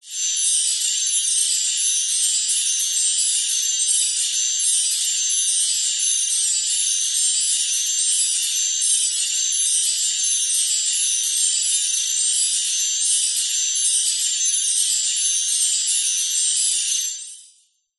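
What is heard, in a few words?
Windchimes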